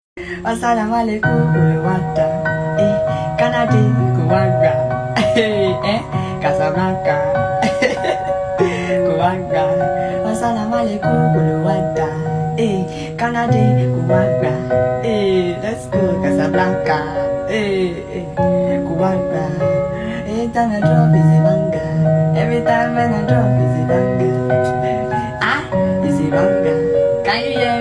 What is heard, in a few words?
Vibe Acoustic